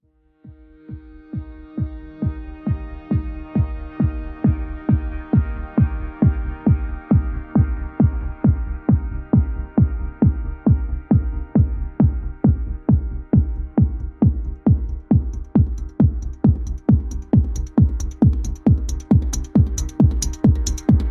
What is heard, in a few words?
techno